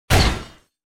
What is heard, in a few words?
sound attack hit; hitsound